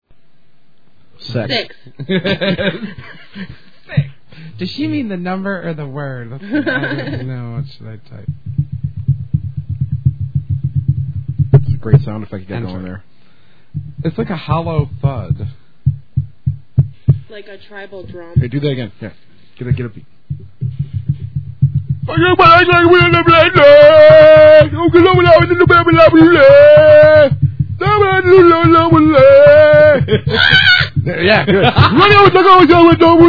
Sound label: drum; GT Pre-Show Hysteria